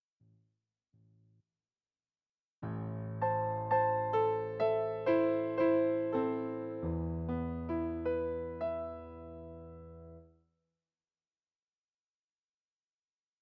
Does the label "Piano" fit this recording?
Yes